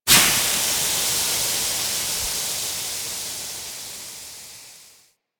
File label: Bridge Steam Release; Warframe; Liset; Hub